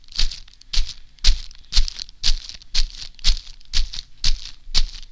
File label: Footsteps